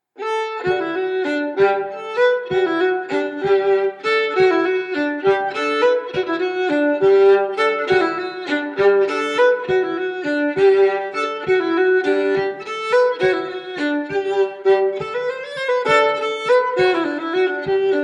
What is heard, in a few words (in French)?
Montagnarde de la montagne en general; Recueil Hainl 2